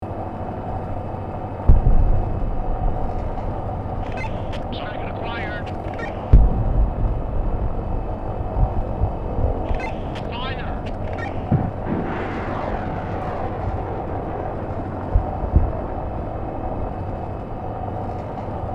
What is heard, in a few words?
Tactical airstrike and target neutralization